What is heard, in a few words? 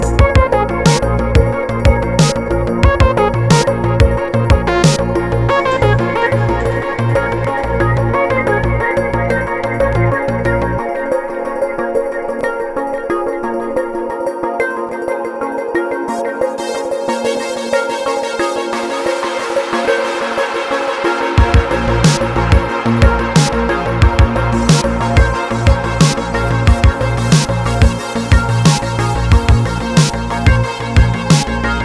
psybient psychill psydub